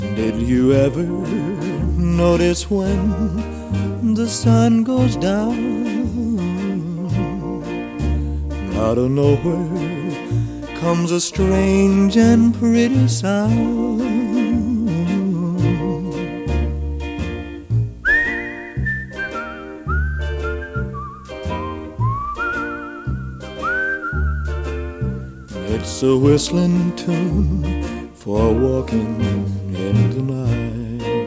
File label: whistling tune